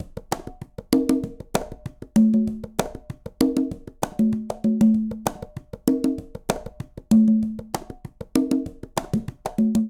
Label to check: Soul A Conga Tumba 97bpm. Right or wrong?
Right